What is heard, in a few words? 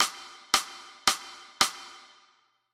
beat